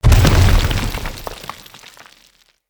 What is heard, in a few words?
Claw Slam Attack Impact; Attacks; Animals; Bromius